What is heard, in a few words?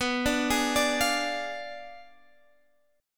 B Diminished 7th B, guitar